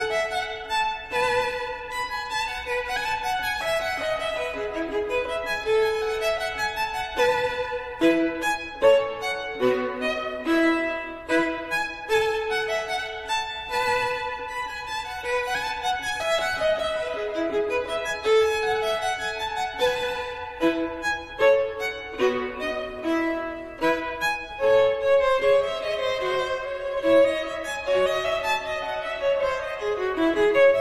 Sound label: Gavotte en rondeau, Violin